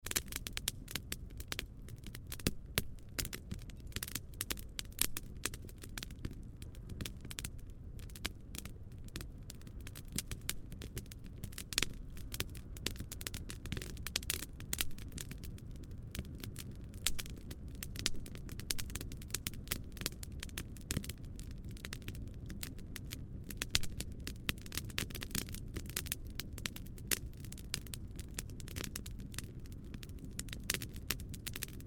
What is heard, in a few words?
Fire Campfire Busy Crackles Snaps; War